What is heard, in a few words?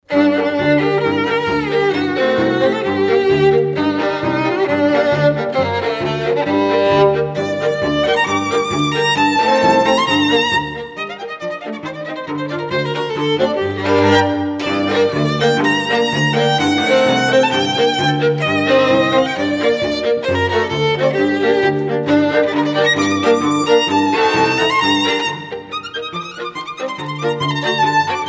Hungarian Dance, quartett